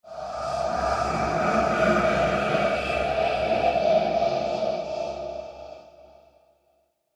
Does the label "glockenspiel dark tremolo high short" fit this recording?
No